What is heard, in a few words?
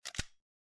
Undock, ui; OnePlus